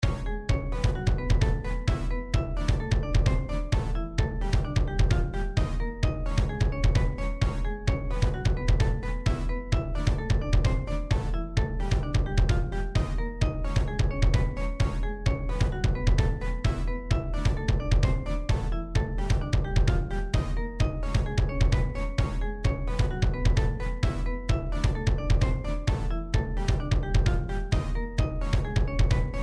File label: victory loop; gameboy advance; sequenced; mario luigi; yoshi 64